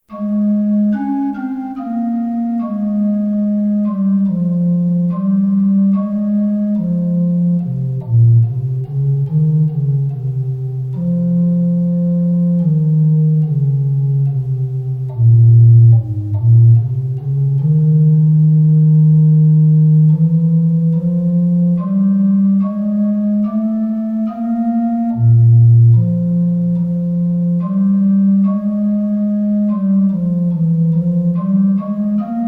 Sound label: Instrumental